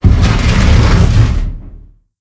Drag Drum; blue archive

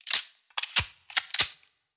Reload; blue archive

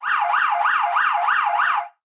Ambulance; blue archive

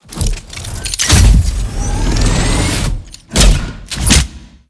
Laser; blue archive

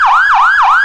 yelp, sirens; federal signal pa 300

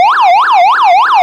yelp, sirens; code3 mastercomb

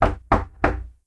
Knock; blue archive

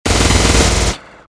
skill special; chasers; bellona

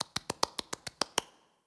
Clap; blue archive

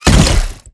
Drone Missile Attack End; blue archive

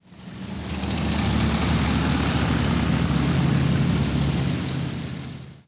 Tank; blue archive

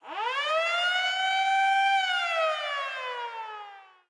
Alarm; blue archive

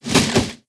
Salute; blue archive

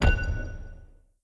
Boss Create; blue archive

